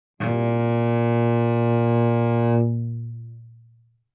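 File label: Cello arco fortissimo sul G Bb2; MIS Pitches - 2014; Strings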